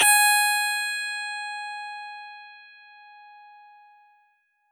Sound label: g sharp, guitar